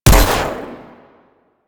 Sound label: Electro Mag Trigger Explode; Warframe; Corpus